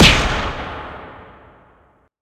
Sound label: rock Hit; HeavenStudioPlus; karateman